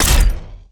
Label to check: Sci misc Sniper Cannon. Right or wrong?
Right